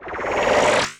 Skill steal; 100rogues

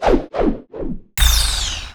Die fairy; 100rogues

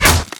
Bow impact body; 100rogues

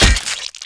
Crit impact; 100rogues

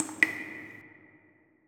Cave drops; 100rogues

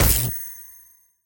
Wand hit; 100rogues

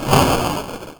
Missile fire; Megaplane

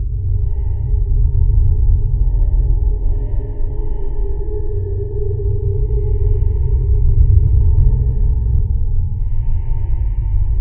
Dungeon ambi; 100rogues

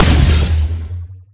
Emp shot; TinyTanks

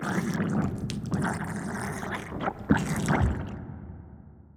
Dungeon drips; 100rogues

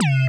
UI cancel; Megaplane

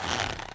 Bow pullback; 100rogues

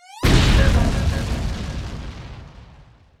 Tank destroy; TinyTanks

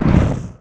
fireball Impact; Boss; 100rogues

